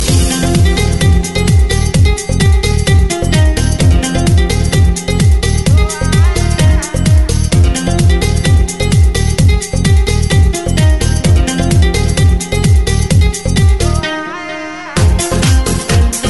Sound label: remix ringtone